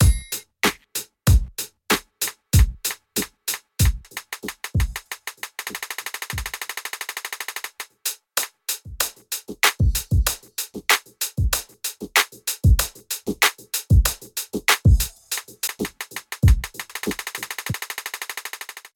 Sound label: high Loop